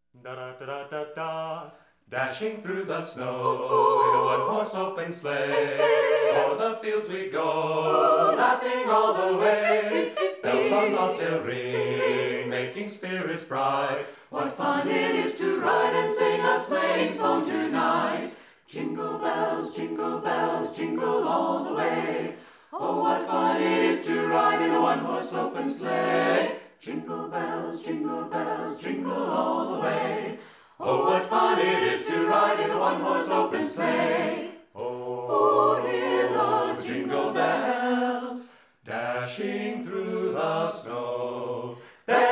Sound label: Jingle Bells